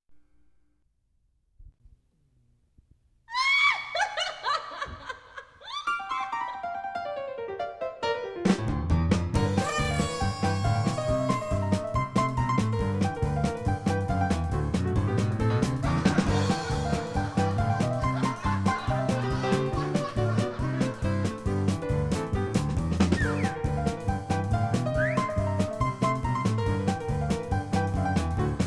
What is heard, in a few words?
polka; ghosthouse; zucker